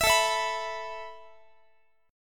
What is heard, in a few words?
A Suspended 2nd A Guitar, chord; Asus2; strummed; x,x,19,19,20,22; 4A,4E,5A,5D,5G#,6C#; JGuitar